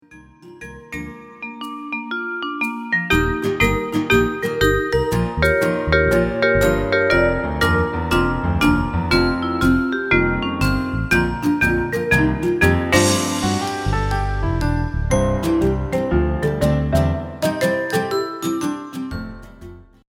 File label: Instrumental